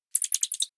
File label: distress calls audible